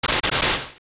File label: Lightning; Lightning striking each enemy